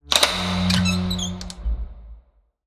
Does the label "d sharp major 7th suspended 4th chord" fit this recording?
No